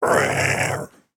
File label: evil salamander, monsters; GGJ22